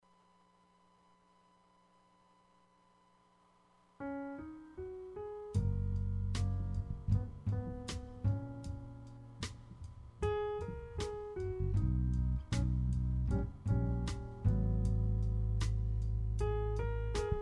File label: jazz